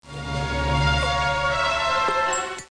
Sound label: Little music piece; Querkz 1.5; Shareware Games